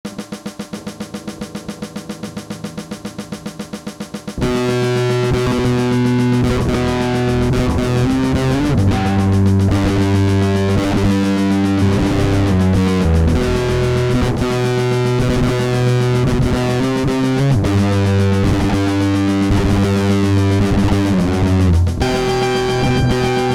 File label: winter drums